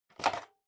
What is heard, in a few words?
Intercom Handset Pick Up Gentle